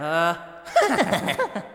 giggle; Sonic and Jet

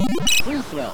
whistle

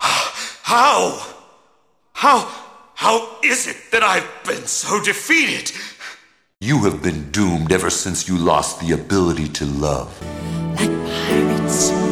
howl; dracula

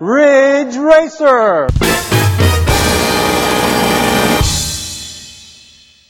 Taiko

